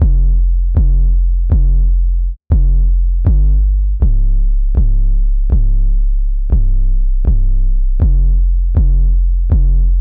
beat loop 808 120 F; swiss

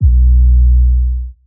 Not Nice 808's

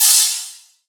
Crash

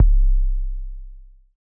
808 LOW

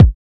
Kick

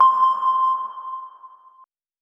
sine one shot

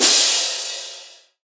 CRASH, Cymbals; CRASH 1

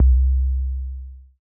808 MID

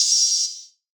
Open Hat

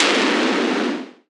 Impact, FX

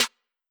Open Snares

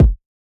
kick trappy; Kicks

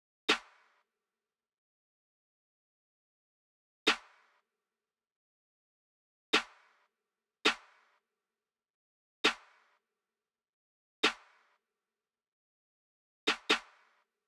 Beat Starter Snare

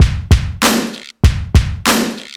Loop

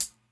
HiHat

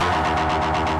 Guitar FX

